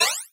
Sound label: jump; JumpinJack